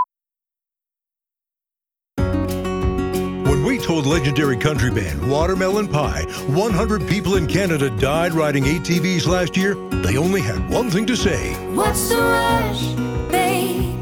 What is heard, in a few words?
Country